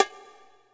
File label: bongo high